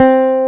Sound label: E BASS C3, Bass Guitar, STACK BASS; AKAI S6000 CD-ROM - Volume 1